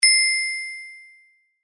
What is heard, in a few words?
Precipitate; phet io wrapper sonification; molarity